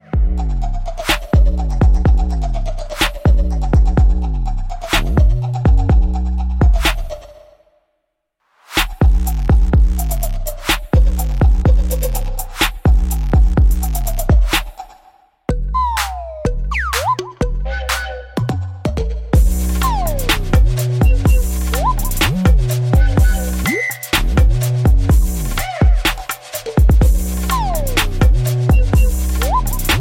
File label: Choo Choo